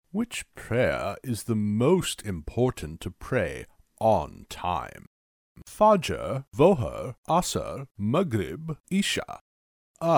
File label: Islamic sampler